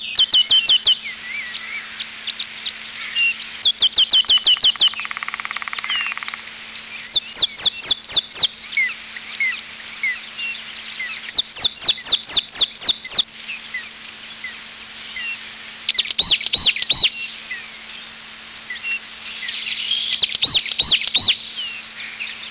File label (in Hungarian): baratcinege